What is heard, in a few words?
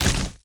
Step; Helroid